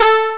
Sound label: sq brass; Atari Mega Archive